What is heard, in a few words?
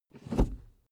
suv pull back gear straight; verkehr transport; autos